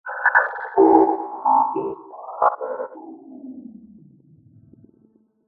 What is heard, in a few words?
synth space weird synthy sci fi robot warble digital glitches; Jessey Drake